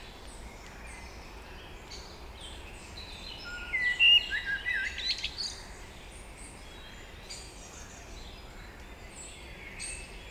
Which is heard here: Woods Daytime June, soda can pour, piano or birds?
Woods Daytime June